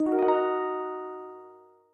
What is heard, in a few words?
game win